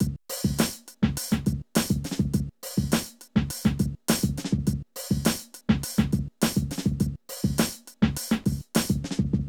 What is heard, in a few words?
Drum loops, Hip-hop